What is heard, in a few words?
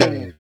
SLIDE DN, FUNK CHORDS, Guitars; Spectrasonics; Hans Zimmer Guitars VOL-1